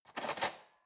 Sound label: cutlery fork drawer handle grab